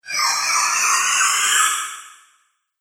Wizard Spiral Spell; Magic Sound Effects

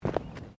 Footsteps Boots Plastic Ground Mat Step; Footstep Foley; Footsteps (Sound Effects:Human)

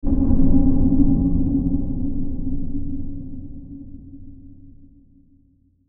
Hit Reverb Ghostly; Sound Design; Blastwave

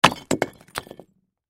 Impact Rock Smash Break Hit Cinder Block Debris; Blastwave

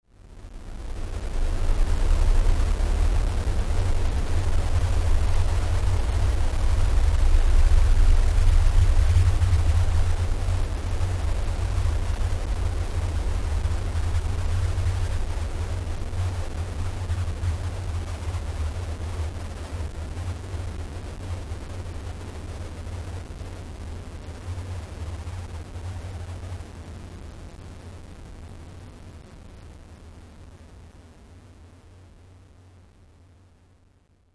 Bit Of A Glitch Storm, noise music

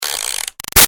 Toy Music Box Wind Up Fast; Music Box , Turn , Wind Up , Fast; Wind Up Toys

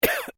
MALE COUGH WEAK; Male Vocal sounds; Clearing Throat; Human

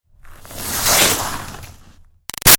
Snow Slide By Icy Scrape Smooth; Skiing and Snowboarding; Sports; Big Room Sound

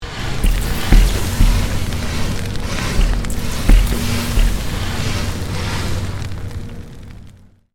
Created Magic Spell Meteor Shower

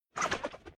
Whoosh Sweep By Spatular Whip Spin Left To Right; Whip Sound Effects

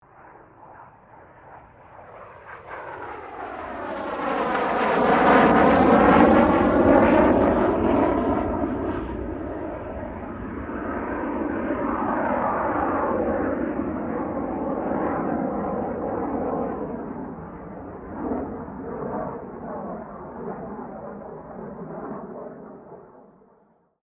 AIRCRAFT CA-27 SABRE PASS STEREO

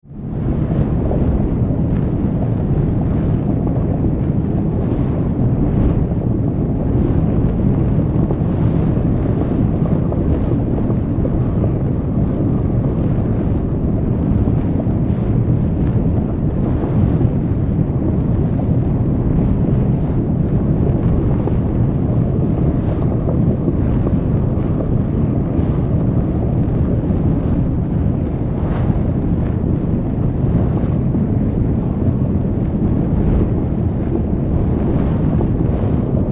Demonic, Vortex, Lava, Fire, Horror; 5.1

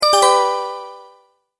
multimedia tone, Goodbye

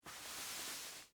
Jeans Movement, Foley, Clothes, Bend, Over; Dressing Sound Effects

